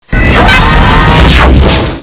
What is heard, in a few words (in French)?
porte; de stargate